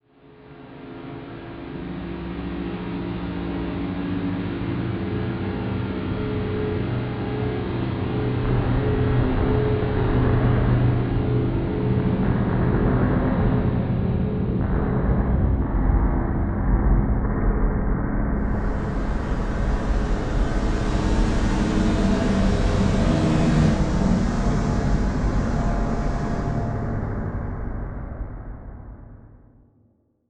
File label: Slow Drone; Adobe; Production Element; Imaging